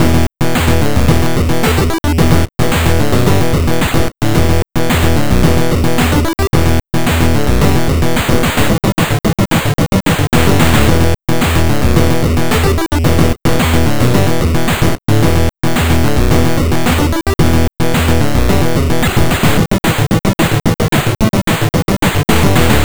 Boss (8-bit); Thorns

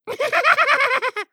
Minion Sword

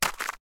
ice

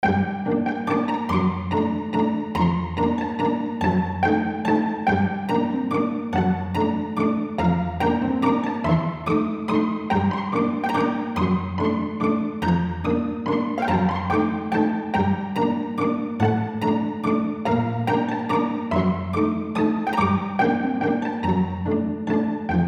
House In A Forest Loop

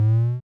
boss jump

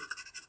pencil scribble, writing scribbles

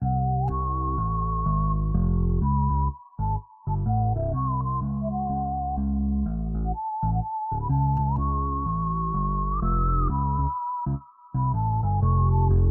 Blues

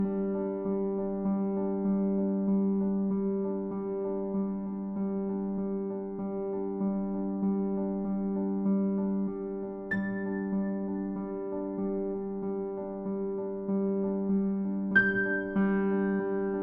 piano theme loop, simple horror piano music

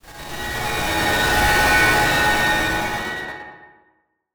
chopper

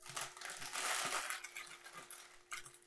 digging, chips bag sounds

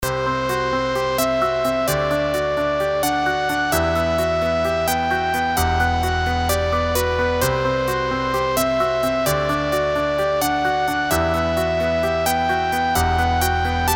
More Time Dance 130BPM

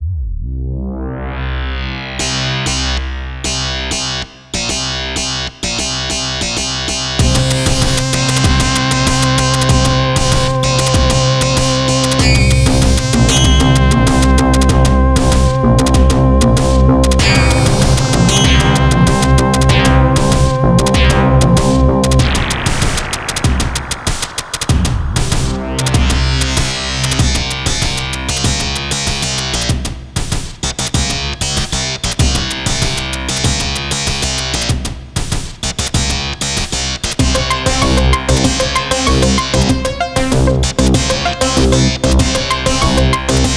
Composition for FM and triangle wave

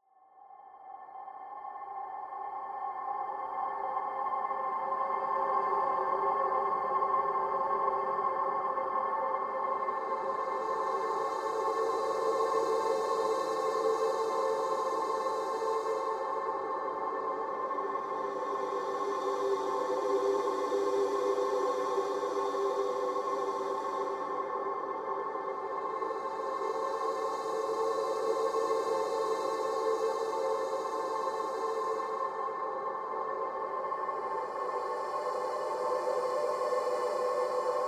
Airy Ambience

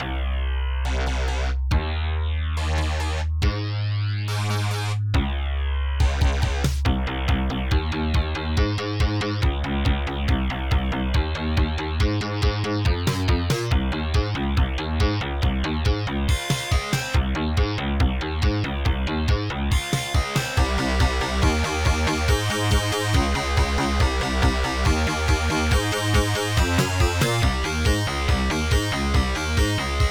sega-style fm synth remix; Fakebit / Chiptune Music Pack

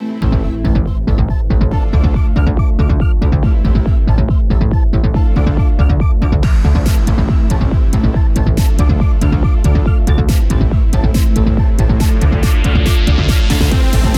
ocean trance bpm140

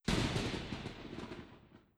plasma Sound

